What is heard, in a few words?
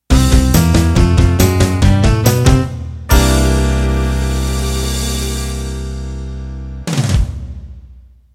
Rock Boogie End